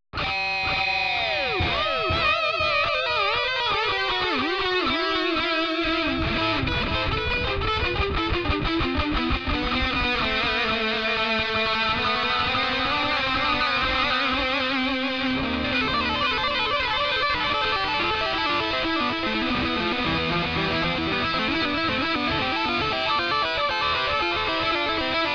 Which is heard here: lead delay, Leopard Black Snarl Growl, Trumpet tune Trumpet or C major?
lead delay